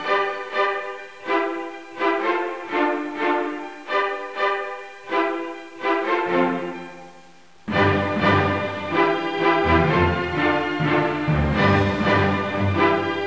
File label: Wild West Style; 2:10 min.; RCT